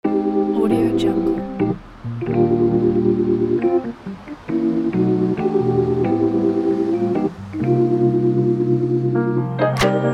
neo soul vlog

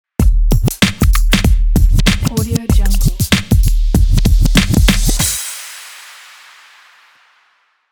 scratch ident

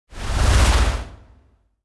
stuttering whoosh; sound ideas

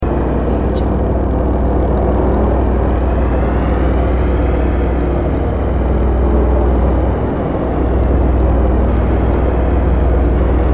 machine room loop

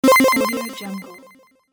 chiptune

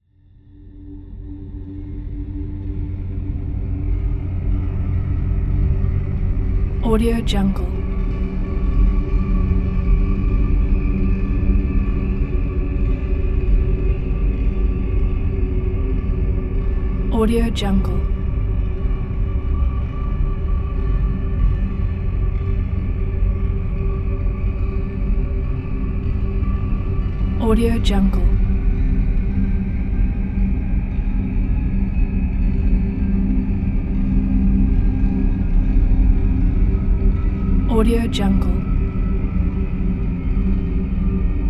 paranormal activity atmosphere; infinity sfx productions

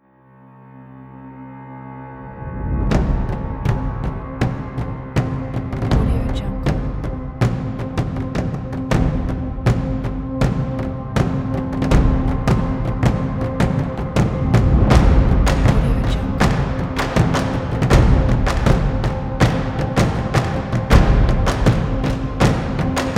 viking drums trailer

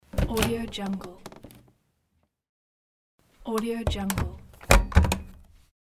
cover plastic open and close

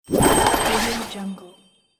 magic circle rotation

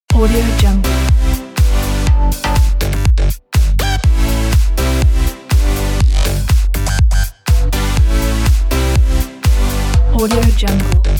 dance loops

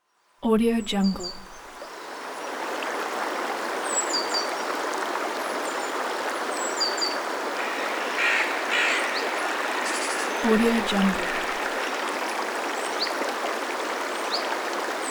forest stream

